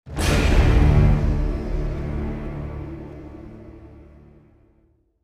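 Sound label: music defeat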